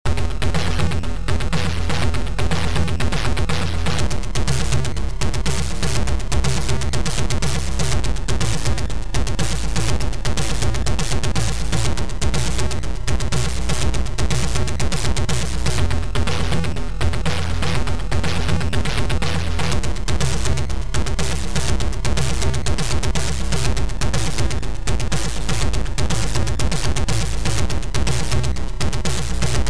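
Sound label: Em C Am B Guitar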